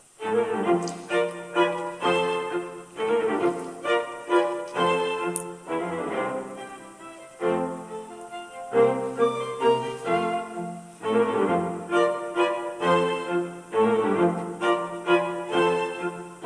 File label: german dance; schubert